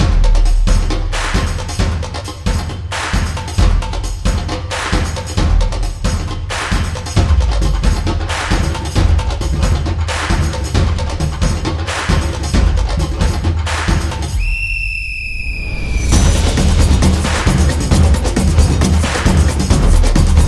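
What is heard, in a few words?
Samba, Football percussion background music inspired by samba rhythm, stadium energy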